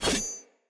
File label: Axe Whoosh Stab; Weapons; Enemy; Axes; Glades Axe